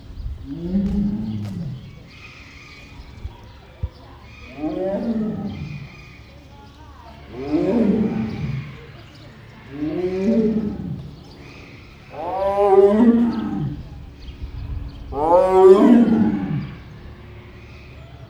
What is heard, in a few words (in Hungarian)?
auuu, feher tigris; állathangok; nyíregyházi zoo 2010